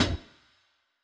Hats, One Shots; Touch Loops; CosmicFunk

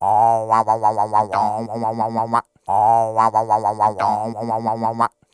ETHNO EXTRA 90; ethno2

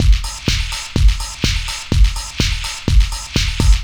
DEEPHOUSE BEATS 125

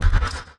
Perc, drums; Touch Loops; BlissedOutBeats

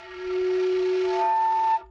bottle, ethno, 125

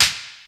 CLAPS; CLAPS+SNIPS

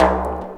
lick, ETHNIC STUFF

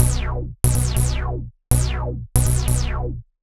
ELECTRO BASS 140 A

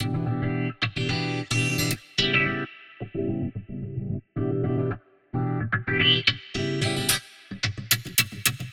110 C Rezzy Funk, Spaced Out Guitars; Loops; Touch Loops; CosmicFunk

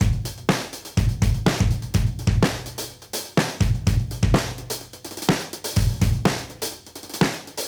Modern Breaks; Touch Loops; ClassicBreakSessions

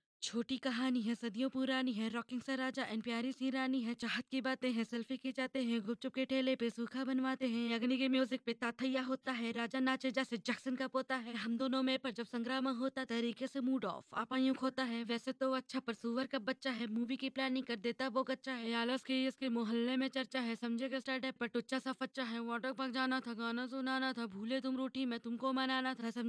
Lead

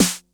909 SNAPPY, TR-909 DRUMS

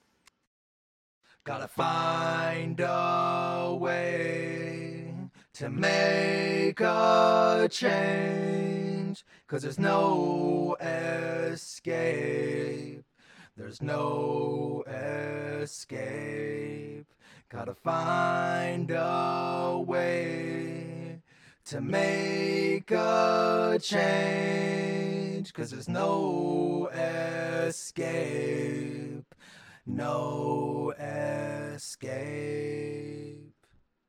Chorus Vox

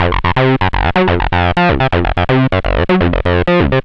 TB 125, TB-303 LOOPS

